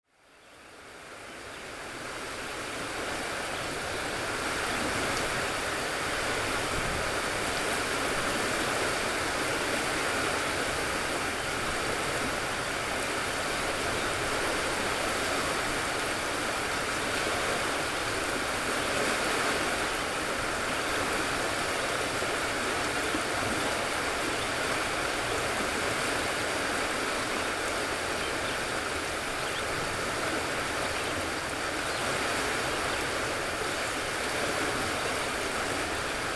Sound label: AMBIENCE WATER; CANA ISLAND; Interactive Lighthouses